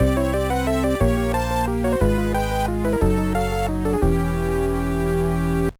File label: Unused Jingle; River City Ransom EX